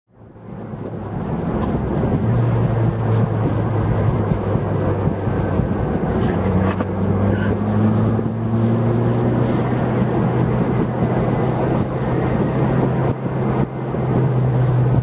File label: Purple H4 Driving Exterior Low; CastleCoombe2003